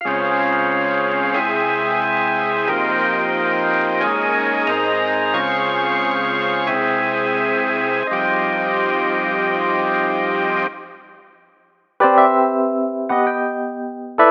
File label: Jazz